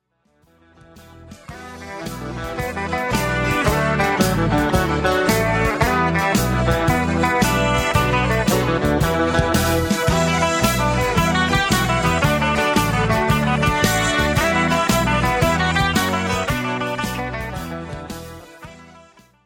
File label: Posties Jig